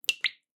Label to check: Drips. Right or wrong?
Right